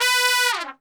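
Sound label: B 2 TRP SHF, Trumpet; Best Service; Brass Super Section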